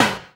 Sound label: Dance Drums; Best Service ProSamples vol.15; AKAI